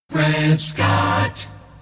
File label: jock jingles; pams series 42a